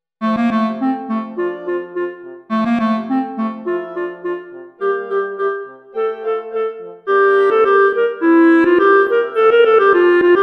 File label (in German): Klarinette